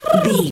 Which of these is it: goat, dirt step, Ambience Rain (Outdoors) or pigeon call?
pigeon call